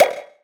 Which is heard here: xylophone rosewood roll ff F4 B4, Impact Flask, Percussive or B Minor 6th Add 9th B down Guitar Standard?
Percussive